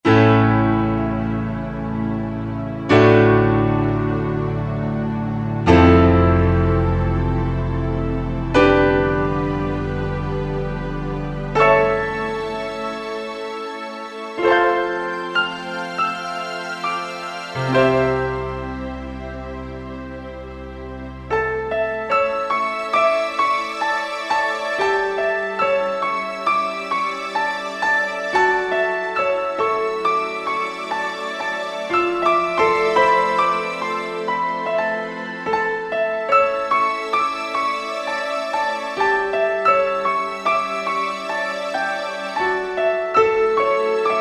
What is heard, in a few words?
Piano Pad, Roland SR-JV80-03 Piano